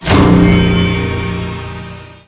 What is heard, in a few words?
Breather Jump